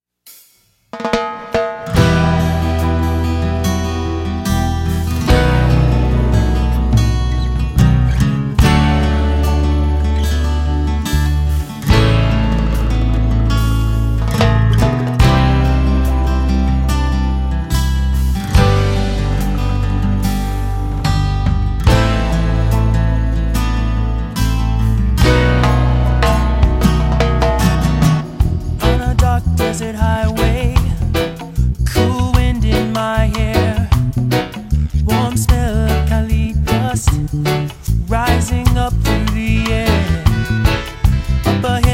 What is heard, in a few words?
Reggae Band; Columbus